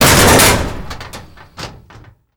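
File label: destroy metal locker big; Explosions; Call of Duty Modern Warfare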